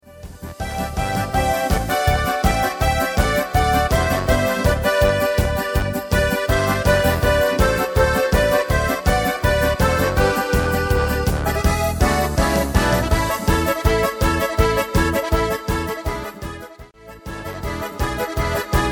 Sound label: Hit Medley